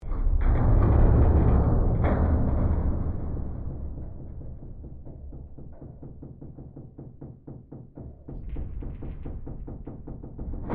Created Ambience Ship Hull Creaks Impacts Loop Stereo, Created environment inside a ships h; Created environment inside a ships hull (Spaceship or Seaship); Artificial; SoundEffects Foundation2